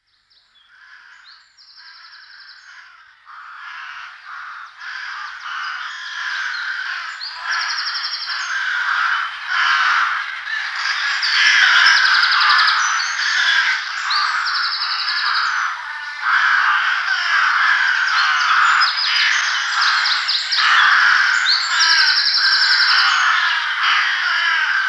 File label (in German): saatkraehe, gesang; singvoegel; roche 2004